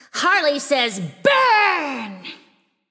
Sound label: Activated Fire Storm; BTD6; Harley Gwen